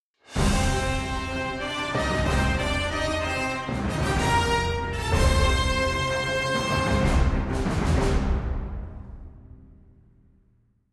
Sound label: Victory; Zurkie's Slaughterplex; Ratchet & Clank Rift Apart